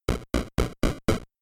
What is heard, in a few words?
door bangin; graveyard